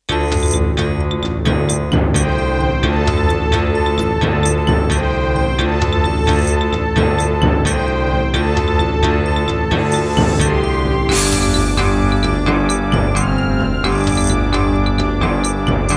Roland SC-7, wavetable; Heretic

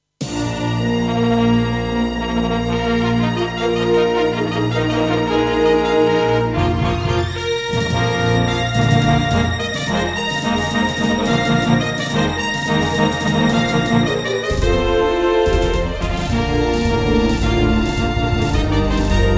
Intro, Roland SD-50 GM2 MODE; Dark Forces

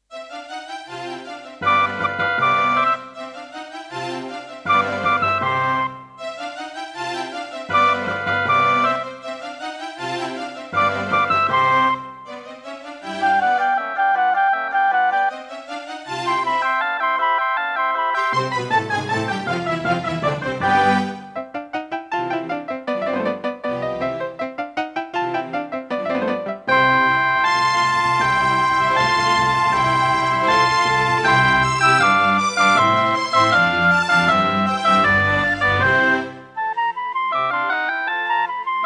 Roland SC-88 Pro; classical; 55MAP; Mozart; Concerto K467 partial

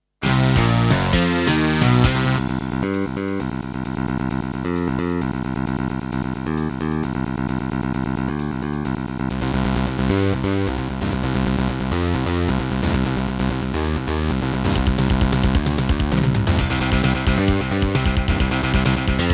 Gallant GMW-1000; sigil doom episode 5

